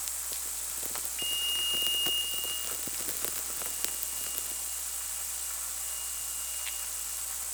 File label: SONAR